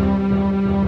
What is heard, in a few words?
music